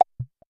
interface button, clicks

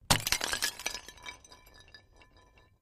Ceramic Breaks; clay & ceramic

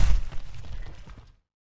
debris impact medium, rock sand

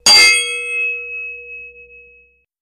Ding Metallic Hit, chimes & bells

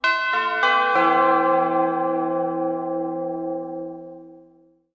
Music Logo; Tube Bell Chime For Announcements.

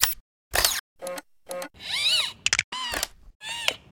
Boxing Robot Fall, servos

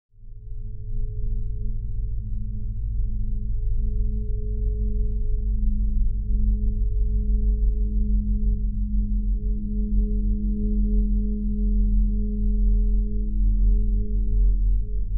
Space Ship Eng Rm Nuc; spaceship fx

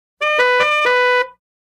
Old Car 2 Tone Fanfare

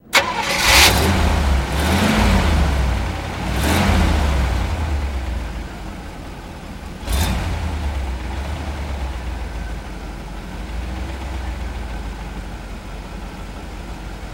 English Taxi Starts And Idles

